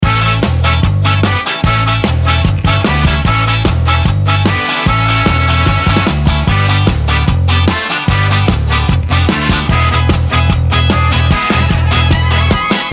Summer Ska Loop

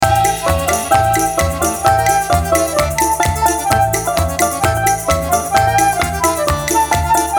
Russian Folk Kalinka Loop

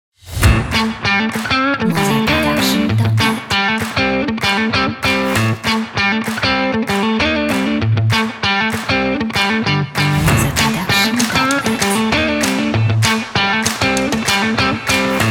Energetic and Fun Upbeat Indie Rock

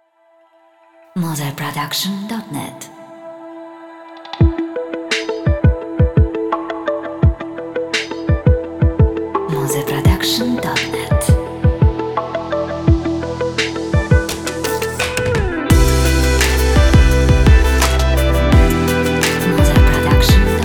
Inspirational Emotional Electronic Trailer

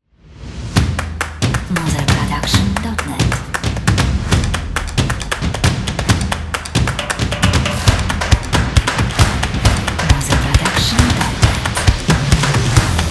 Drums From Hell, Action Energetic Drums and Stomp

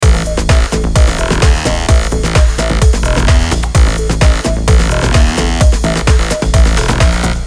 Dance Loop

